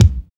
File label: BD, Dance